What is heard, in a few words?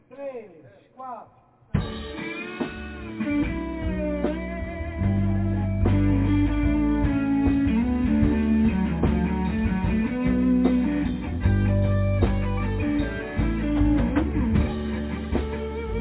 solo